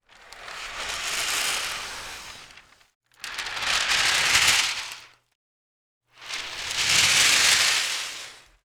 Kite Wax Paper Flutter Swells Lite

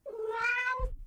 animals cat meow; mono; 96kHz

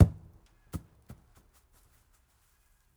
Soccer Ball Bounces Grass

